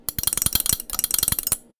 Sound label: Stick Hit Porcelain